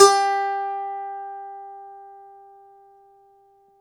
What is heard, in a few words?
DOMRA, Russian Strings